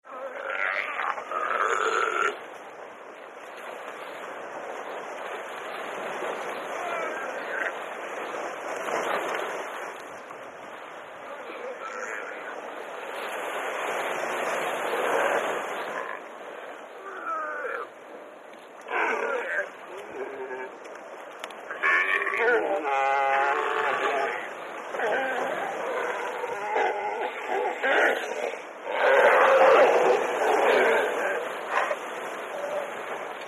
ANIMALS SEA: Galapagos seals, two adults, surf in background.; seals & sea lions